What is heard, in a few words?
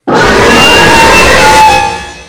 screamer